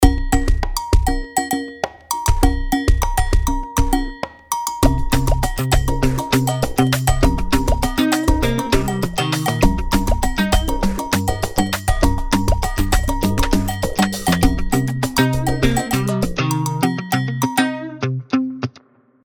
Agogo, ringtones; Infinix; XOS 12

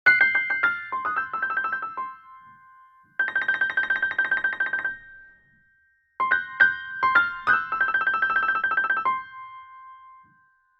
Blues, ringtones; Qiku; Luna Youth

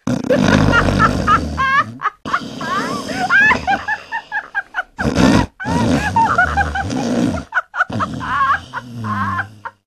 Snoring and Laughing; Samsung SCH-W830 Magic Hole; ringtones; Trivial Routine

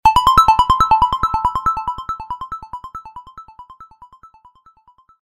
Arpeggios; Coolpad; ESurfing-No1-2021

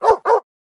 Dog, notifications; Itel; Power 80

